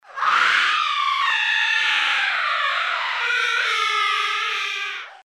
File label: witch, zombie; dod